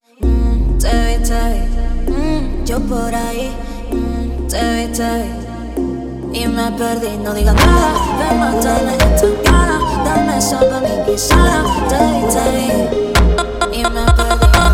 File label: phonk funk